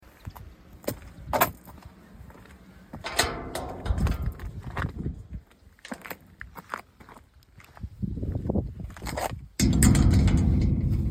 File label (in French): portail sortie; SECONDIGNE 79